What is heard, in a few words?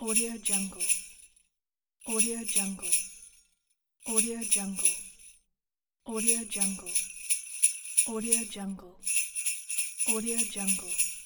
sleigh bell pack